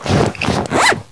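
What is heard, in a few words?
vest, pickup armor; SINDEMO; player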